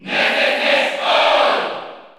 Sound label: Cheer French; Ness; SSBU